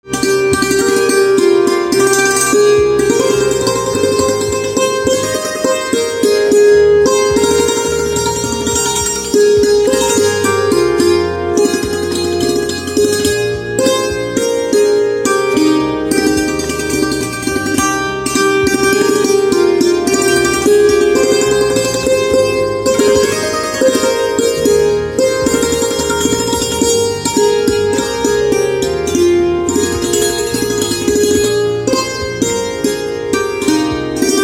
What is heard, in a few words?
Santoor, instrumental ringtones